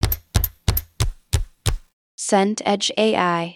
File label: door swing double